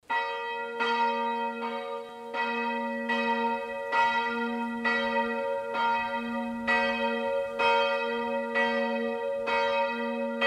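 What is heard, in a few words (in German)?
Tonaufnahme f1 g1 b1, mehrstimmige geläute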